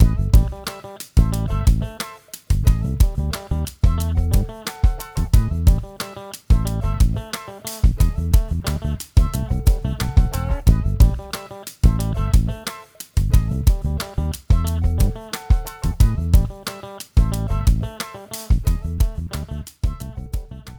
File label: Post Question Loop; YDKJ; The Jackbox Party Pack 5; Jackbox Games